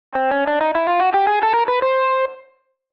Cartoon ascend guitar scale

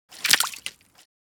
Casting the fishing rod into the water

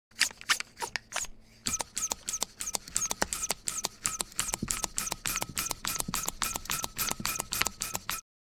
Blowing up a rubber balloon air pump

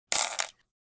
Four small coins drop on solid surface

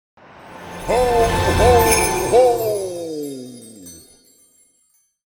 Santa claus magical laughs; festivities

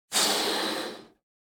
Blowing up a rubber balloon from mouth

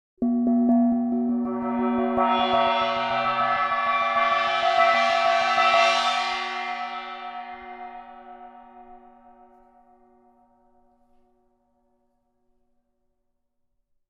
Large gong roll

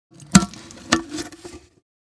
Paint can open removing the lid

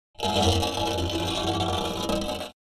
Heavy metal object scrapes on hard ground; Metallic Sound Effects

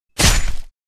Battle axe body hit

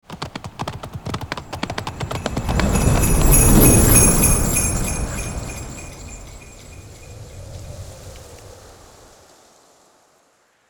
Magical santa sleigh christmas passing by

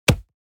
Cartoon body punch smack

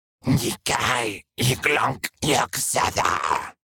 Demon beast imp monster spell casting

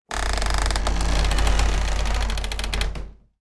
Earthquake wood textures forced bending

